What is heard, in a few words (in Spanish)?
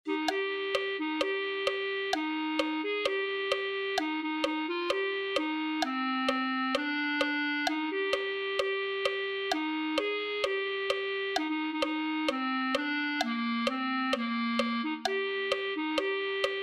clarinete